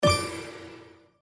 REEL STOP WIN; slots; Magic Coin

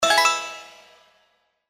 Win Regular; slots; Oriental Ink

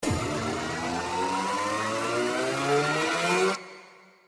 anticipation, slots; Realm Saga

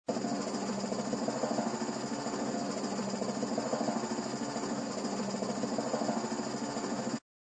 Spin Sound; slots; Paradise Escape

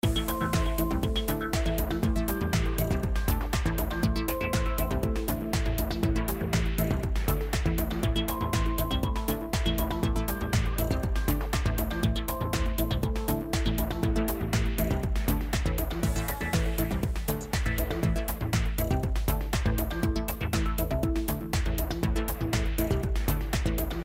BGM Bonus; slots; Steam Miners

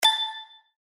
Basic Buttons; The Lucky Fairy

